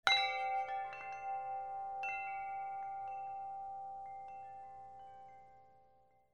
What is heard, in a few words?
BELLS